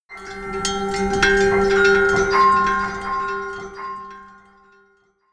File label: melodic odd pad bell high